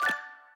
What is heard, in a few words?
Block Match; In Block Match03